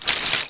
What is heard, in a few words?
chain, weapons M249